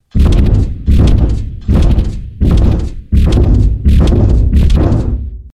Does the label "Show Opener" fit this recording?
No